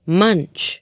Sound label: munch